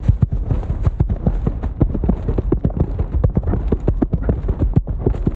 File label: Horse Gallop Rumbles